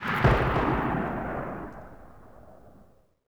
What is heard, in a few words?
mg 1shot echo